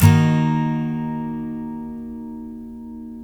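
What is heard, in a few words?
steel D# minor down, CHORDS STEEL, Guitars; Spectrasonics; Hans Zimmer Guitars VOL-1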